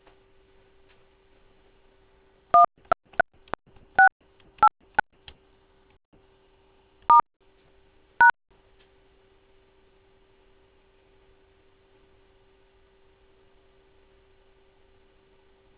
digits, DAHDI channels send shortened duration DTMF tones